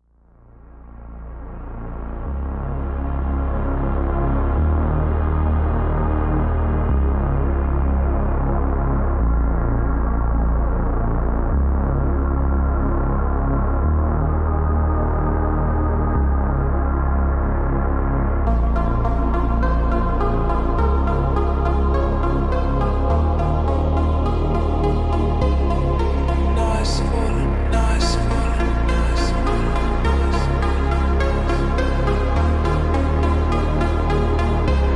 slowed x reverb atmosphere phonk

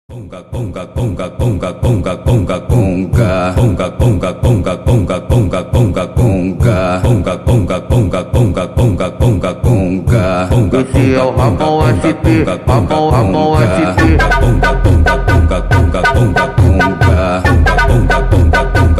montagem sped up

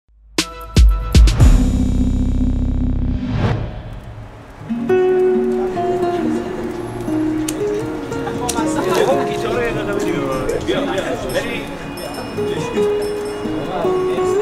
guitar version

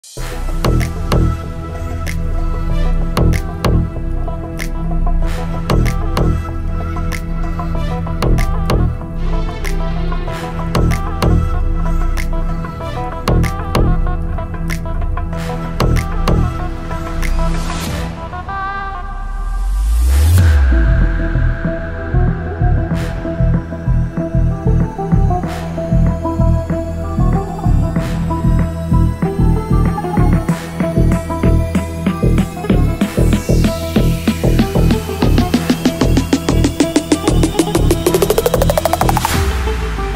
arabic remix new arabic house

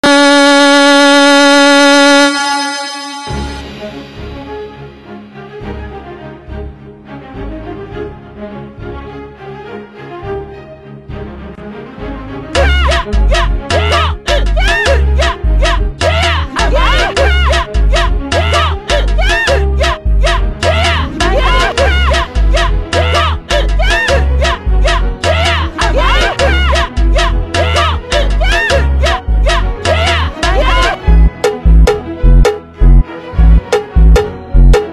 funk slowed

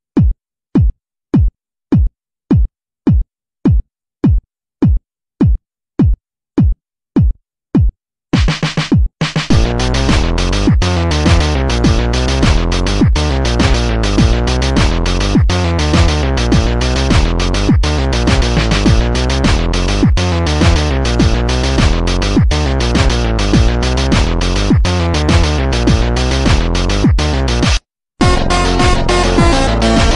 off vocal slowed reverb

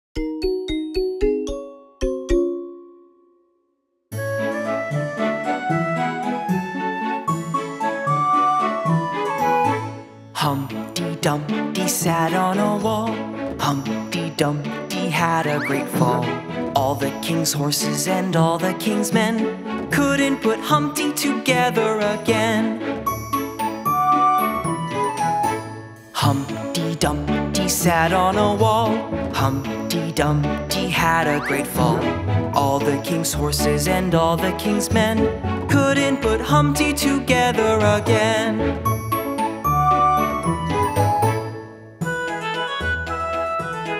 nursery rhymes

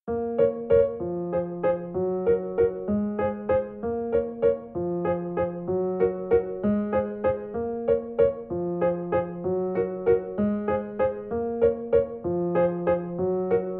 piano cover

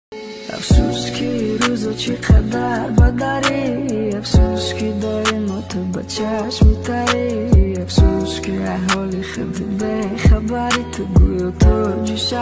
dance uzbek new

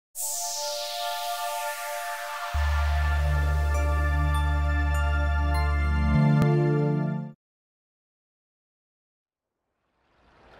Dolby Atmos 360; почувствуйте звук

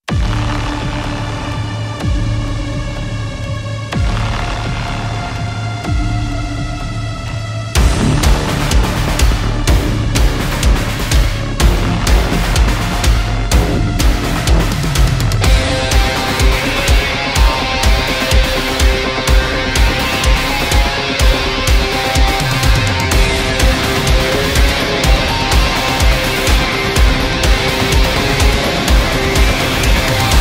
metal cover

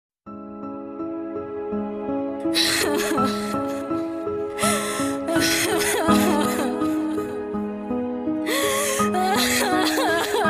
new rap

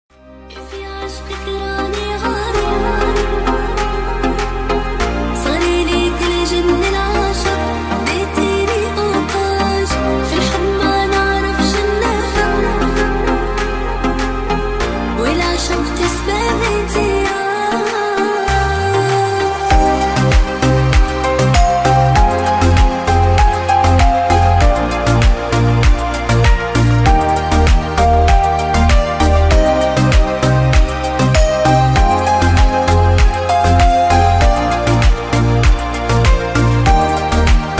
arabic music

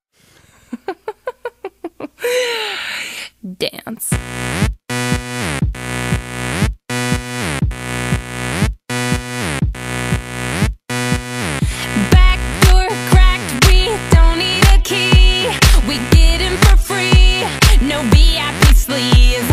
remix bass boost

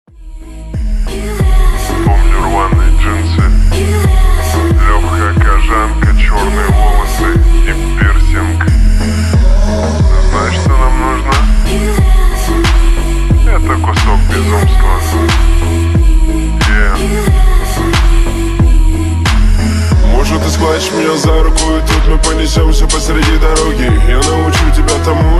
slowed reverb

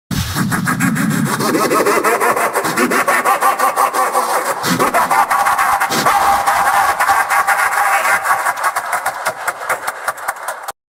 evil laugh sound effect; sonic omt

